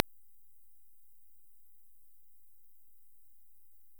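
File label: Rhinolophus megaphyllus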